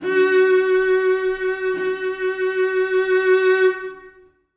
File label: cello f#4; Sonatina Symphonic Orchestra